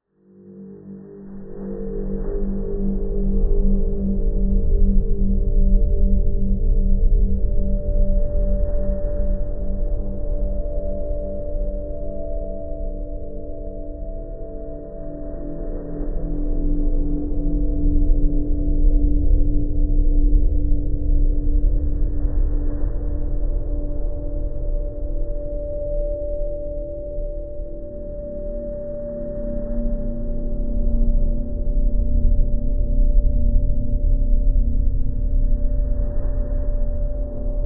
Cave

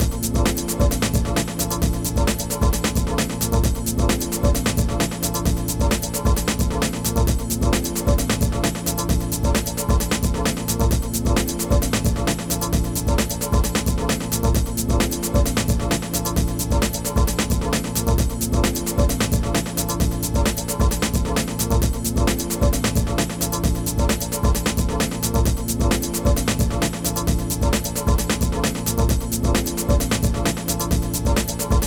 loop fast

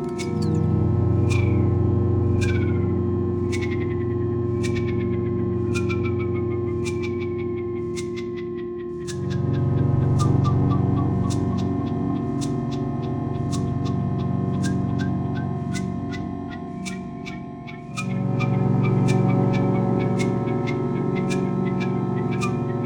bath ambiance

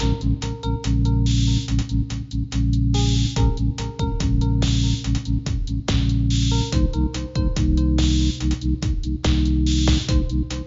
BGM

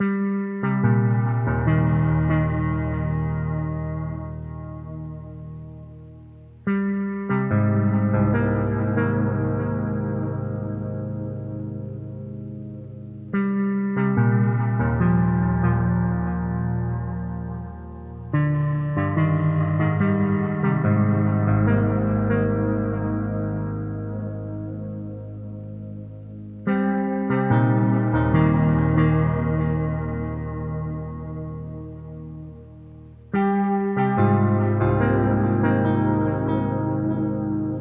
decrepit village

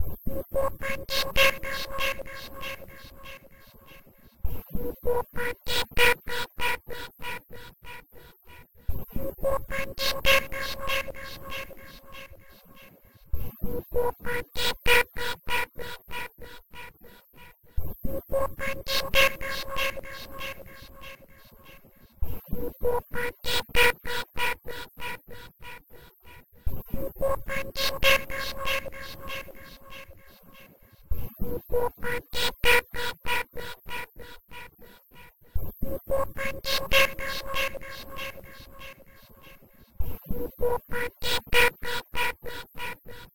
Blackbell slow

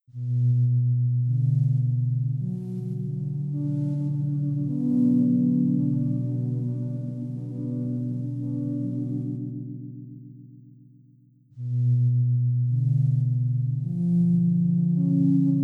Rain

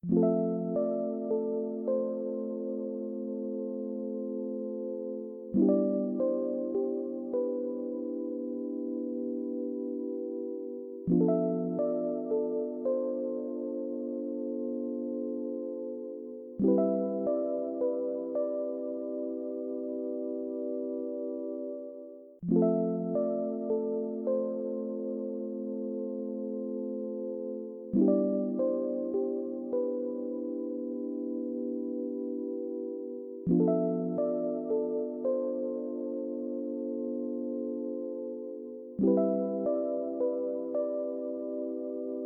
melancholy

